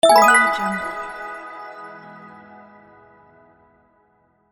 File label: inspirational bells achieved